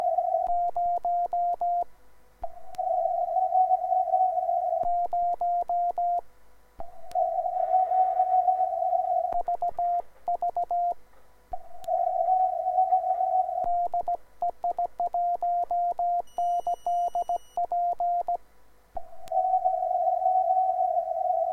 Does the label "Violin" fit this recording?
No